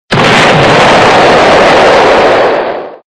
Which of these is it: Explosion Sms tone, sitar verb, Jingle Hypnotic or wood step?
Explosion Sms tone